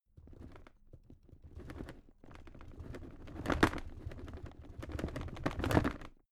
drawer cabinet carton rattle

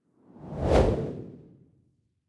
whoosh simple hard long

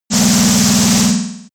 short powerful blast

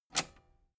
metal button robust spring push enable

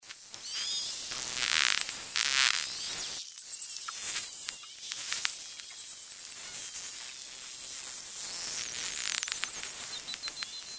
beluga whale

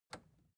record player power push off

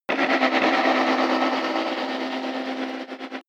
drill synthetic

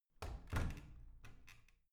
washing machine close soft

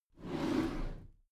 packed drawer smooth slide close

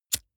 lighter wheel spin

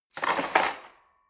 cutlery forks drawer place

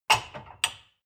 dishwasher cup place

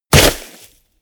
body fall snow hard bounce

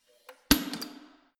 letterbox metal push close hard

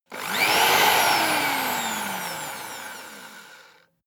vacuum cleaner hand-held on off

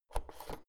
oven knob press in

